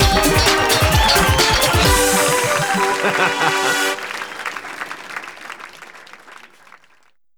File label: Level Up